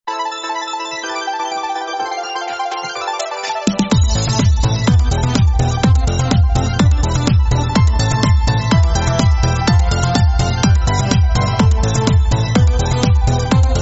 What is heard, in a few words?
Pop